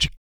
VOC PERC, BASS PERC; ILIO; Vocal Planet VOL-3; Jazz & FX

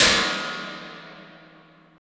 CYBER KIT, DRUMS

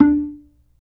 B-3, percussion; Keyboards of The 60's and 70's

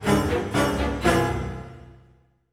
Orchestral Loops STRING ACCENT; Best Service ProSamples vol.33; AKAI

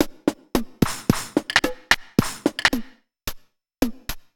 Bent Korg MR-16 (110BPM), cyborg beats

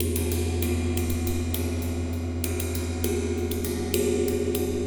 Wind Gong Cymbal Brushes, Ambient Metals; Sonic Foundry (Sony Creative Software) - Ambient Atmospheres & Rhythms

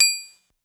Triangle; Lost Tapes From Mars

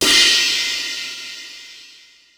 Crash; Ensoniq ASR-X Pro

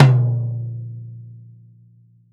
velocity tom; VELOCITY TOM 1

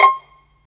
STR PIZ VL, String Sections, Violins Pizz; Roland LCDP13

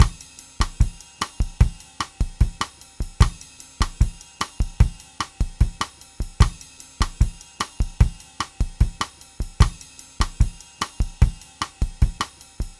Bassa Nova, Latin Percussion, Drumset; Sonic Foundry (Sony Creative Software); Joe Vitale Latin Percussion

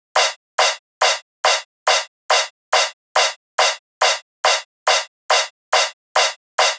drum Loop 140BPM Ride; Multi Loops

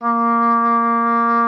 A#3, OB D.AMORE; Xsample VOL-7

